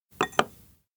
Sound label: glass place alcohol table; anemoi